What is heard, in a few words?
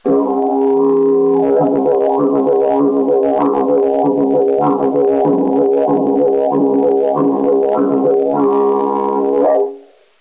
C sharp, didges